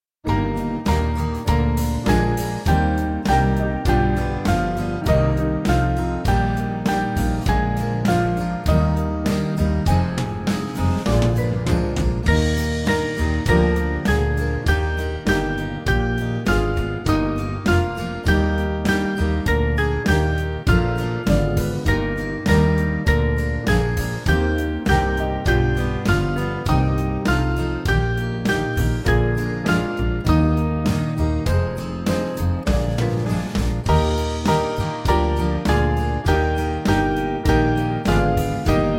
Band; Jesus Tender Shepherd; Evening Prayer

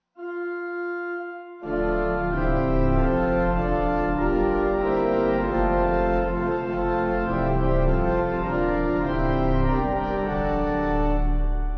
Agnus Dei, Pipe; Communion Service

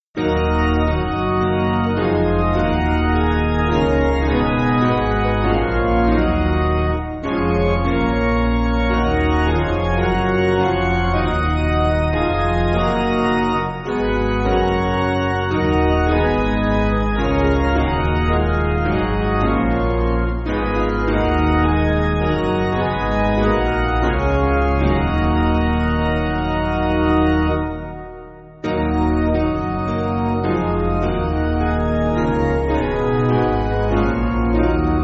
Piano, Music Backing Files for Churches; Quite Through; Dunstan; Ebm

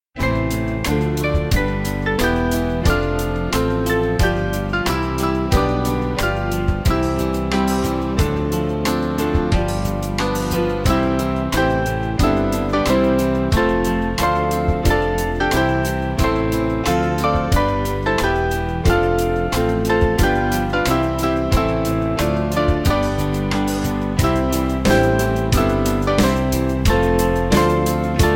God Bless Africa, Band; Small Band; 1/C; Huddleston

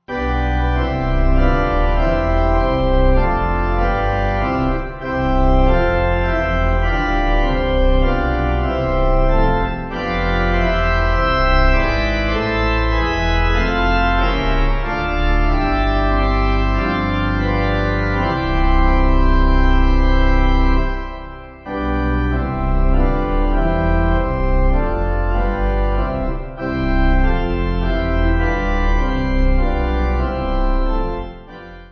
Pipe, Pipe Organ

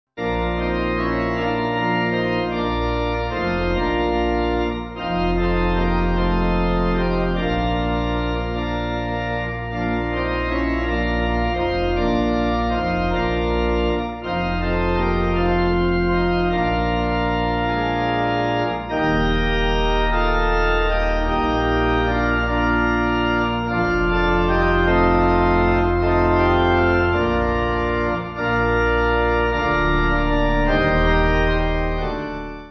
Pipe; Be Not Dismayed

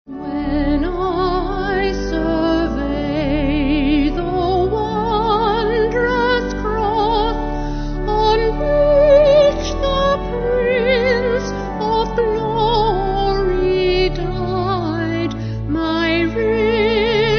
voice organ; When I Survey; Rockingham

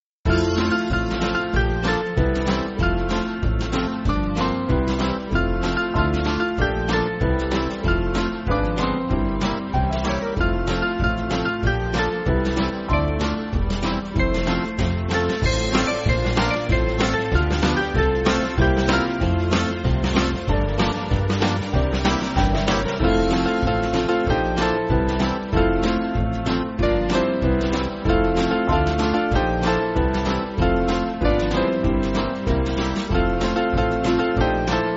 Band, West Indian Spiritual; Mary Had A Baby

